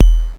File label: BD, Mutable Instruments Anushri